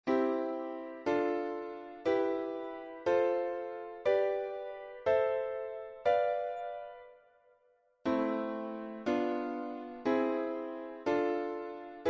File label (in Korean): 3화음